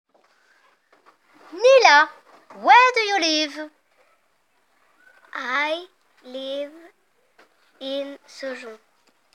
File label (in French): vocal